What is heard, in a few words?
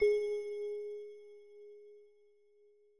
message contact in; stereo